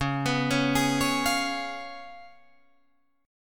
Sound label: C sharp 7th C sharp, Guitar Drop D